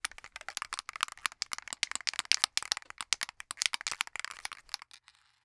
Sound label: Industry Spray Paint Shaking Can